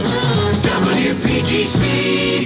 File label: jingles; drake aa image pack originals